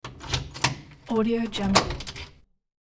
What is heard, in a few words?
lock dead bolt unlock with key; hollywoodedge